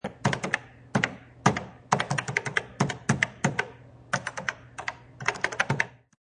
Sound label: Typing on a cash register; Cash Register Sound Effects; Bars